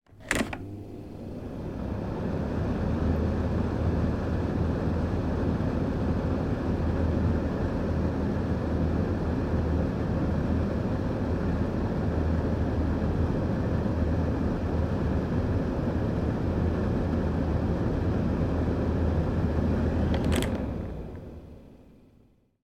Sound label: household fan on run off